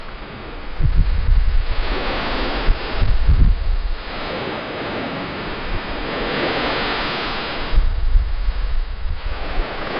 seal sounds at sea; sound effect

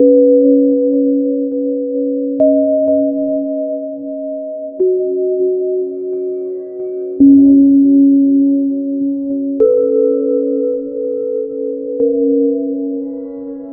style titl, smooth end

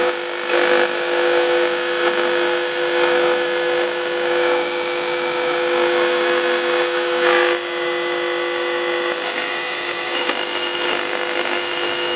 fm radio tuning, broadcast; tivoli

